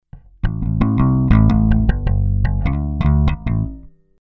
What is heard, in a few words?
bass preamp; Radial X-Amp (500er-Series); Brummen